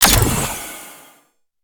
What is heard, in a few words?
p atk revxbow